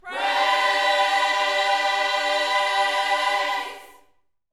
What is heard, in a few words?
YOUTH CH PHRASE, Vocal Planet Gospel